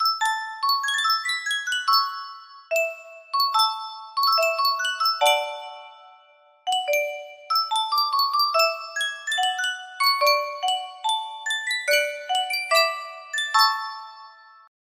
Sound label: sankyo music box, music box melody